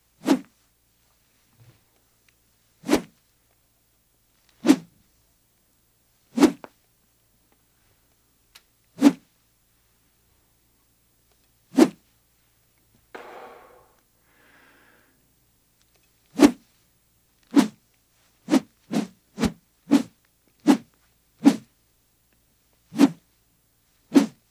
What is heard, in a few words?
Rod Metal Swish